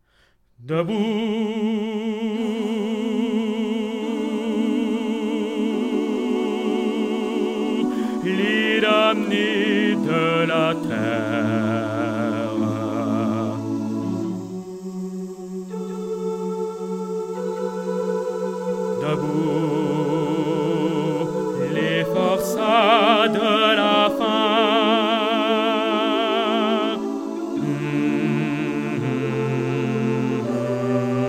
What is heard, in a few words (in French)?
basse; L internationale